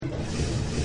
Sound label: step city